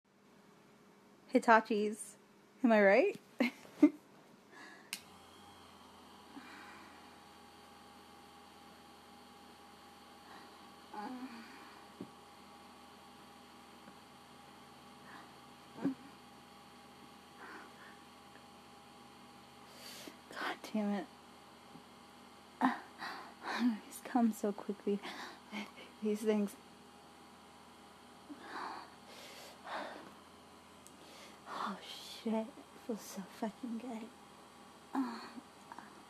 [F4A] Lady Noises [hitachi]; Lady Noises with my new toy

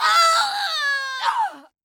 Long Scream

